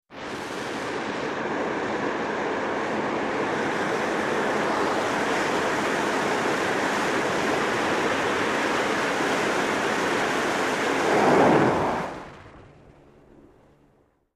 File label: Catapult take off of jet fighter from aircraft carrier